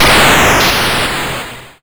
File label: YM Noise; NOISES; ChipShop Deluxe; ChipShop Hits